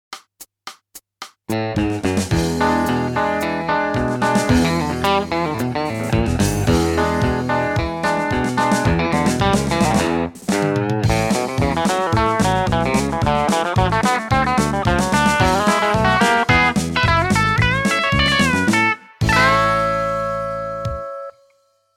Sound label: country